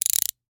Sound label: Rachet, vanadium steel, 8in, standard, locking, clicking, mechanical, new, socket, rotate, quick; Eiravaein Works; Vaeyan IV